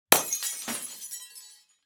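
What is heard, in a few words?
light bulb shatter concrete, interior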